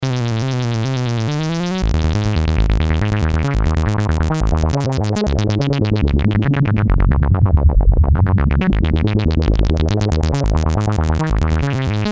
seq, korg ms20; synth; archon

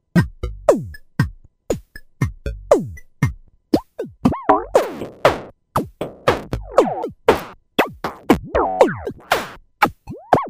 korg z1; synth

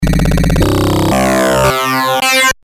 amiga heavy synth B; commodore amiga

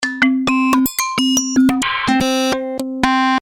pcm, roland jd800

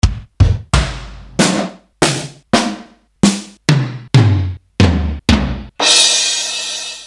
heavy, drum, yamaha rx5

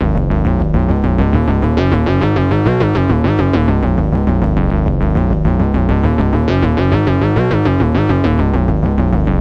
synth moog, yamaha tx81Z

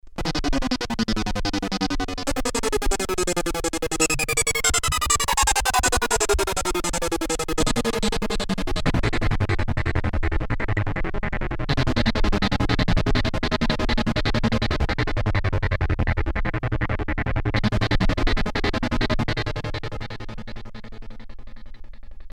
fx pan, redsound darkstar

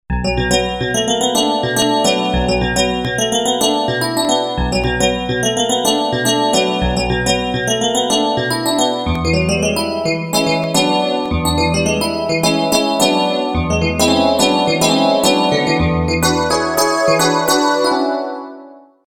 piano tine dx, korg n364; piano: DX tines; main CPU upd 70433 (V55PI) manages 64 voices polyphony based on Korg AI2

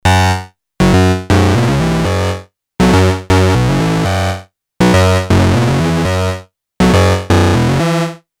Synth 2; MFB nanozwerg

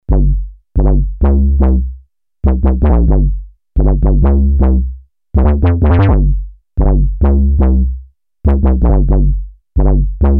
synth woobles, Moog minitaur; analog monophonic synthesizer

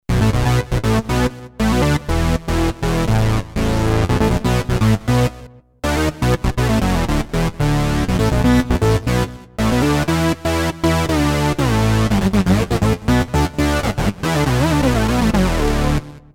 synth moog 55; Creamware minimax

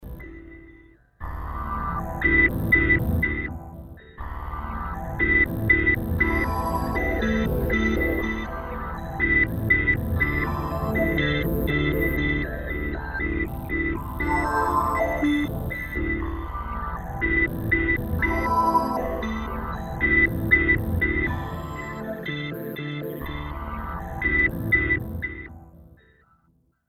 synth; Emu XL1 Xtreme Lead

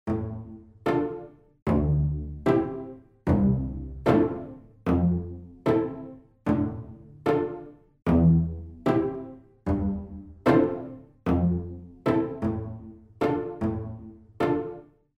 pizzicato; emu mo phatt

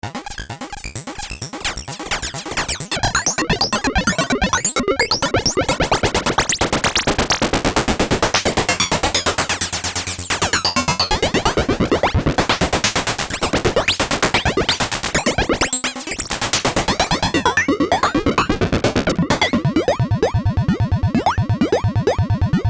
alien, Compact analog monophonic synthesizer; MFB nanozwerg; FX: alien

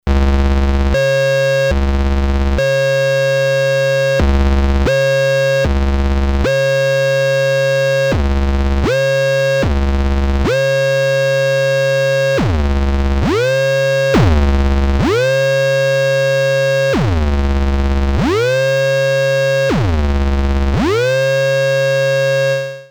glide, nanozwerg; MFB nanozwerg; test: gllide mode

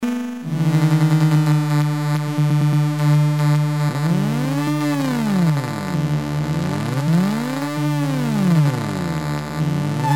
raw, redsound darkstar

